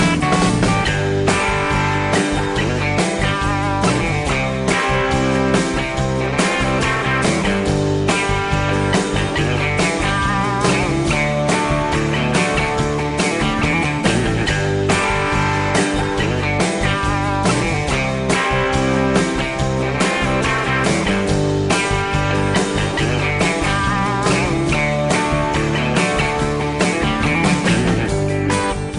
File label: Country Tune